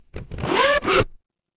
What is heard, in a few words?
paper fabric zipper